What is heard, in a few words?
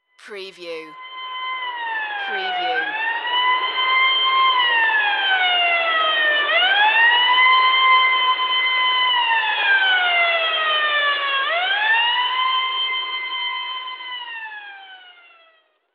Vintage Ambulance Driveby